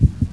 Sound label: heartbeat fast